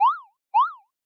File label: Bounce Around; LOOPERS